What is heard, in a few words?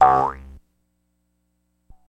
Jaw Harp Twang Vocal, boings / twangs; boings / twangs / snaps